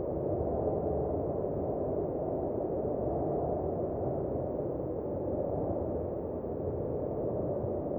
Fly Backward

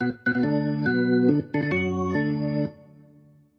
Organ; Fourth of July 2019

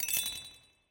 Goal

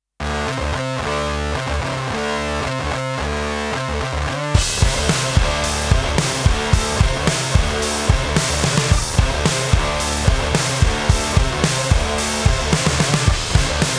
Young Blood Main Track; monster energy supercross the official videogame; ps4 steam switch windows xbox one; UPM

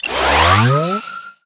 Target SFX Aquired Combo

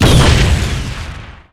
Enemy Fashion Damage Hit; disney star smash android ios mobile gamerip 2020

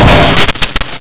Death Train; Miner Max

